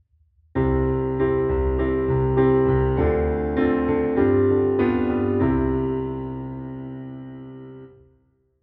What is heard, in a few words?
Dm G sus4 G7 C